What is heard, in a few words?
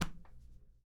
Drop Book